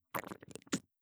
こぽっ

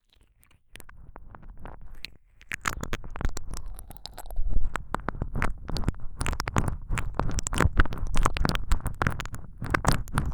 ぐりぐりぐぽぐぽ耳舐め, ASMR

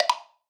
ポカッ

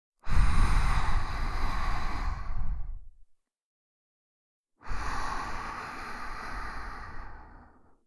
吐息

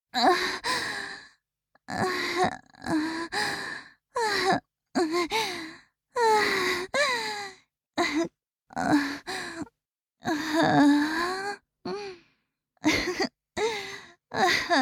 絶頂後の息遣い; 実用ボイス抜粋集

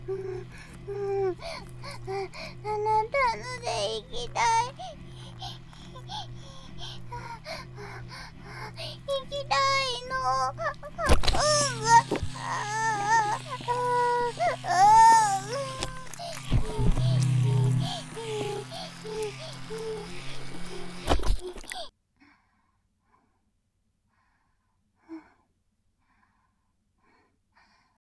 バイブを引き抜く; 11秒後

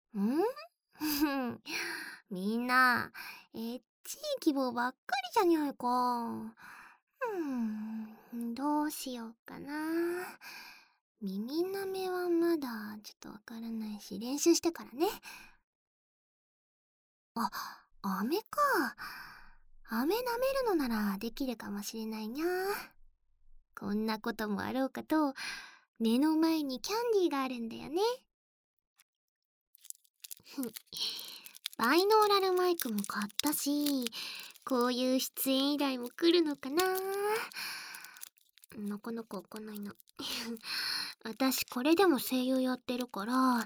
バイノーラル 飴舐め 放送, ASMR

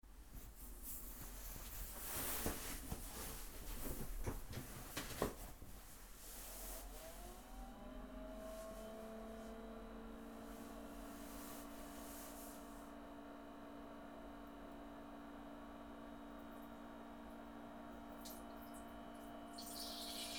脱ぐ、座る、おしっこ音（声なし）

